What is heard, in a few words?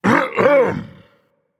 Clear Throat Dragon; fafnir